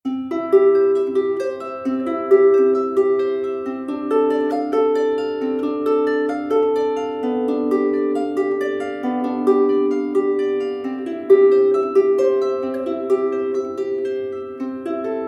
natural harp classical sequence; loops; titan

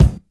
smooth kick drum sample c key

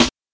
bright steel snare drum sound c key

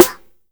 prominent snare sample f key; snare-drum-samples

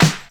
fresh round snare drum sample d sharp key

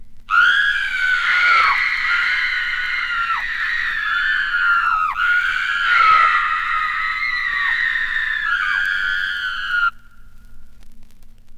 two women screaming horror

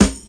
sharp steel snare drum sound A key; snare-drum-samples

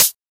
good closed high hat sample c key; hat samples

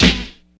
00s clicky acoustic snare sound a key

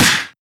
clicky snare drum sound a sharp key

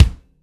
silky bass drum sound a sharp key; kick drum samples

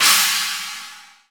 crash one shot C sharp key

